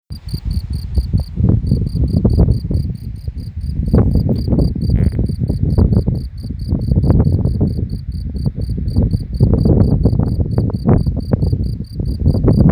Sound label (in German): feldgrille